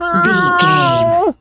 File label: cat meow